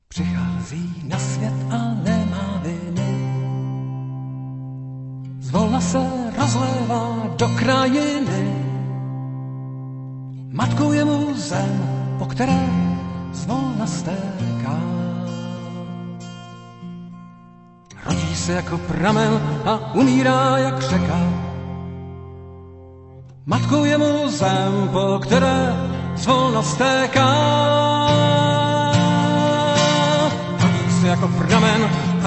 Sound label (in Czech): kytara